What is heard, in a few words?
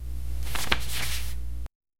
soft paper turning slowly; small room